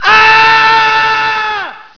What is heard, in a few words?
ahh, scream